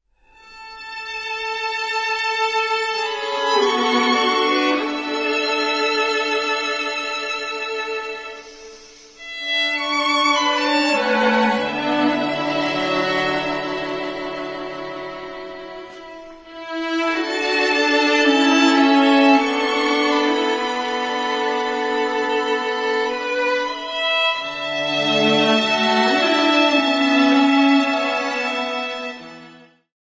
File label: String Quartet